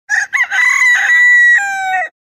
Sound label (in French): cocorico